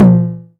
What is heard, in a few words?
Tom 909; One Shots; Drums